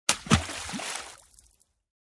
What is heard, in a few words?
splash